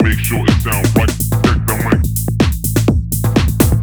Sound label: Techhouse Kit Funk